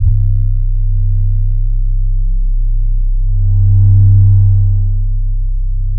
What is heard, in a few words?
808 Plasma; Kryptic Samples